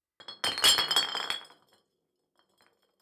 House Bottles Tipping

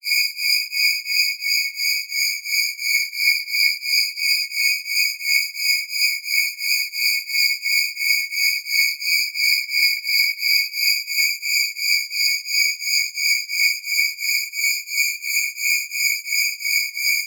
Horror Voilins; Klankbeeld

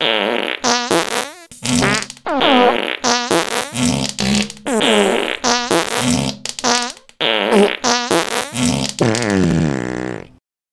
Fart Loop

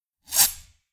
Swipe

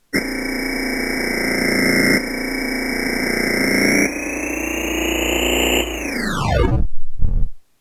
Granu grinder

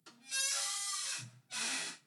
Creaking Door

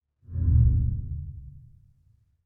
Transition Whoosh

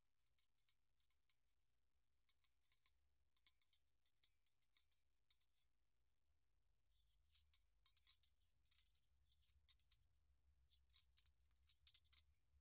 Handcuff Clink

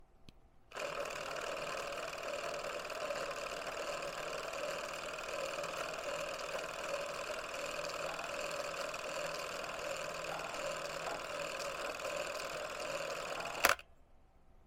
Old Video Camera

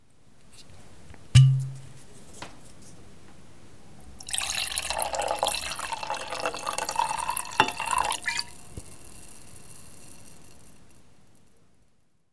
Pooring Wine

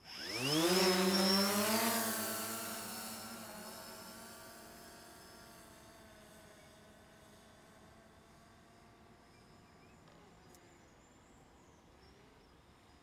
Drone Take Off

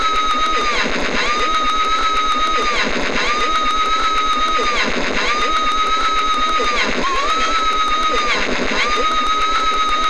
Screaming

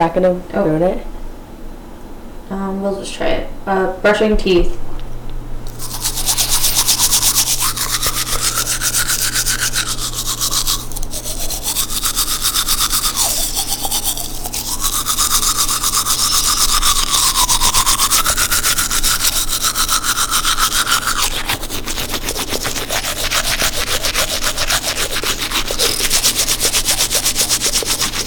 Brushing Teeth

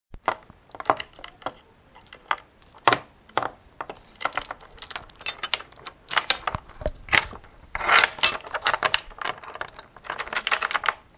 Door Chain

